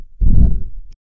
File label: jingle